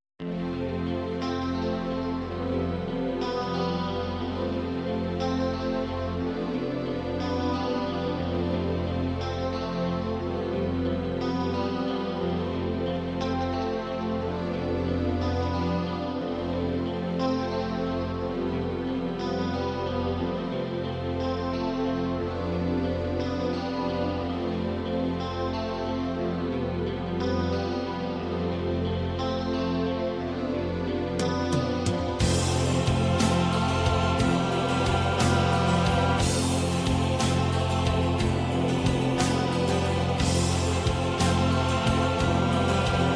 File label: Instrumental; Elea